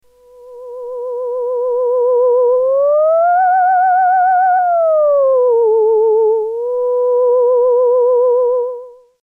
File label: theremin; OSCar